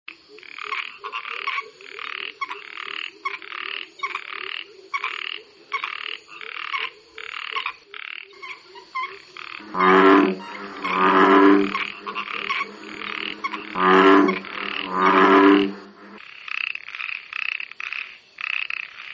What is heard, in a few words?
frog korus